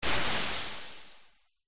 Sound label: magic wand whoosh burst; zapsplat